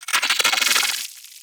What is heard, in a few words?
Arachnoid Micro Vocal Attack; Warframe